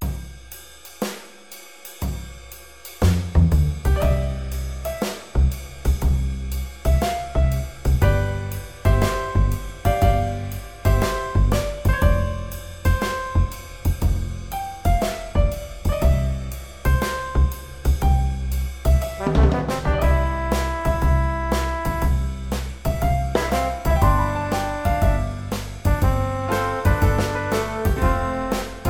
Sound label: Gently morning jazz